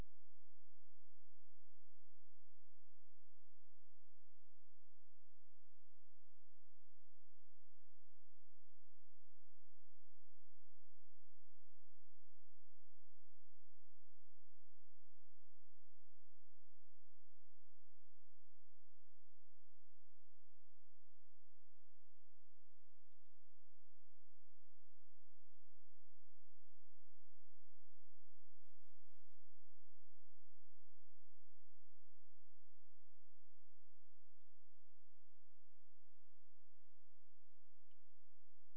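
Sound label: hydrophone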